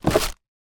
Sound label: foley booklet instructions in packet pick up from drawer; zapsplat